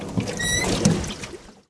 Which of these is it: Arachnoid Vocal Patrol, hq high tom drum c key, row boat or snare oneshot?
row boat